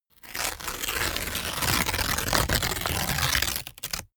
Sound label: sheet crumpled tear up slow